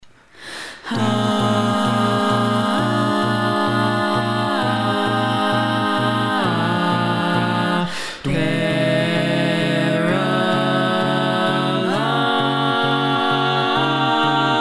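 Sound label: Baritone, barbershop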